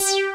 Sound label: JS BASS; Pro-Rec - Synthsonic; BASSES